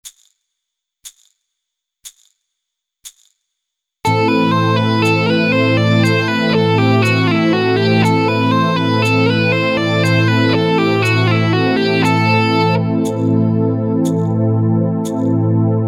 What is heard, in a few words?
LEGATO STYLE